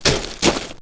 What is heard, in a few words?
Chaos Hydra Move Sound; Heroes3